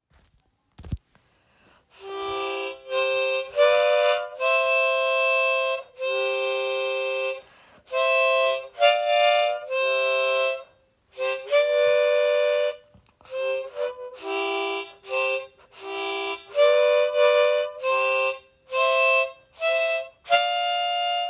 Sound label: Down in valley w-o notes, Harmonica; voith usa